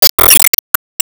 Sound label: Enemy Tail2 Armor Brake